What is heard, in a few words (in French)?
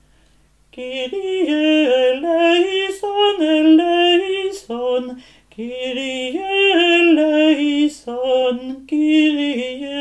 kyrie basses; charpentier